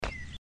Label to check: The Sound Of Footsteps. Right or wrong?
Right